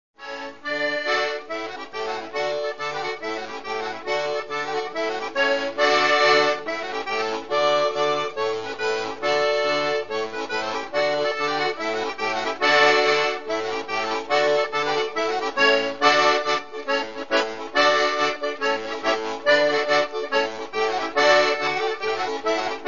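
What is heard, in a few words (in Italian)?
organetto; Il ballarello; ciociare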